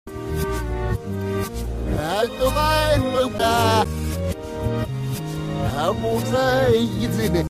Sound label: die